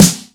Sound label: airy sizzle steel snare drum f sharp key